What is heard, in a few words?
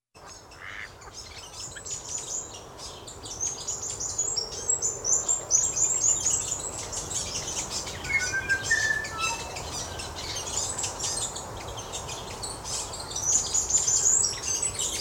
Birds and Bells